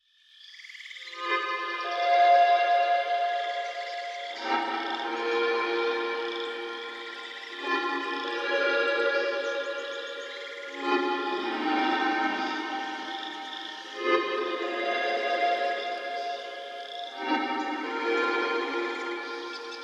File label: Mid Boss Pre-Entry; Inukari Chase of Deception; xbox series xs; Forest 02